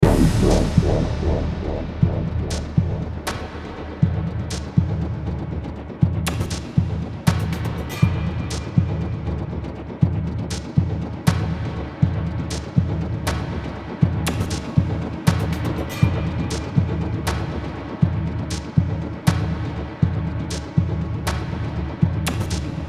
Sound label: music upcoming action light; freelancer-hd-edition